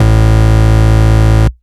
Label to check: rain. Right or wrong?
Wrong